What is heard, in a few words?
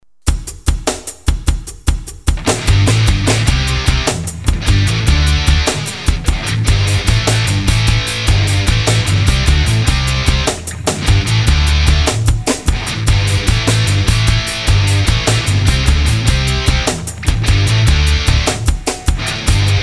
Rock Energy, Hard Rock